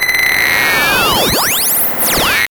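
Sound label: SYN-FX, synth; E-Lab; X Static Goldmine 1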